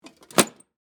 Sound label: Lunch Box Close, Foley, Lunch, Box, Lid, Close, Metal, Case; Lids; Objects